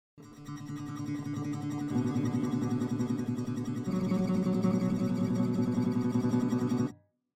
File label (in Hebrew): גיטרה טרמולו